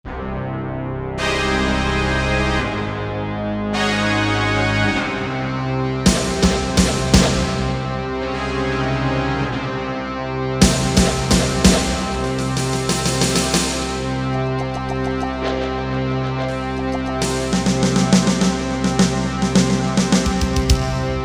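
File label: Brass Drum, Korg MSC-1S; Korg MSC-1S Variety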